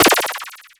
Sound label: erase